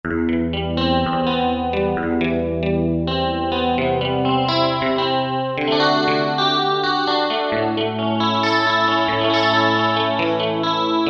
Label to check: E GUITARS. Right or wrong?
Right